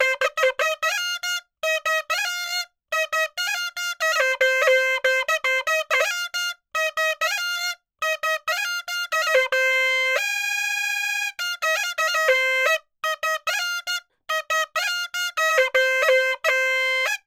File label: Freestyle Pepa Loop; Gully Loops; Zeng Raw Pepa Sample